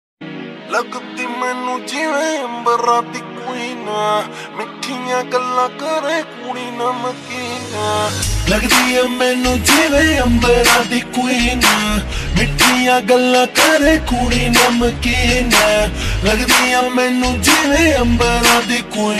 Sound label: slowed reverb ringtone